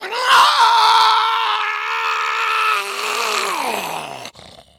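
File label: Monster Creature Growl, foley monster vocals; Monster Creature Growl Howl, Agitated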